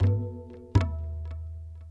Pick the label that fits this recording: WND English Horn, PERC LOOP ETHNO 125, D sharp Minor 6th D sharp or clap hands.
PERC LOOP ETHNO 125